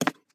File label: Peg Leg Footstep